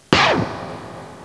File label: Scratch